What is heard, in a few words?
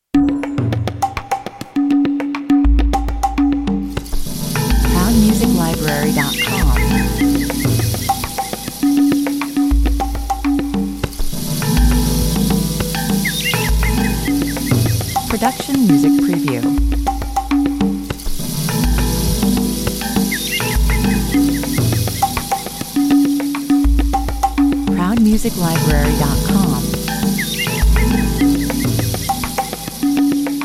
percussion with special effects, 102 bpm